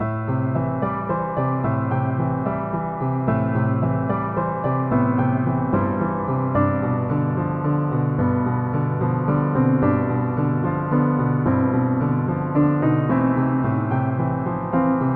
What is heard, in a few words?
melancholy piano